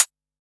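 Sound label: Analog SY1 Closed Hat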